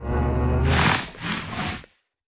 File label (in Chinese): 监守者嗅闻声